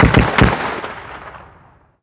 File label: gun 50cal med; modern warfare 2; amb elements